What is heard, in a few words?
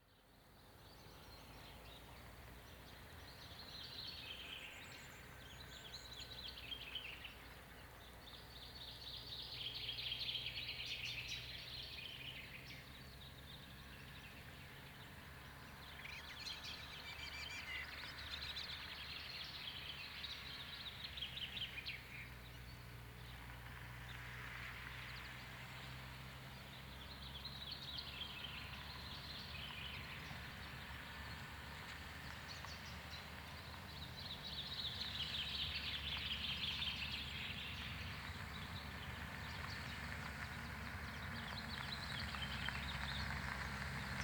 birds car lake bank binaural; Lagoa Sao Bras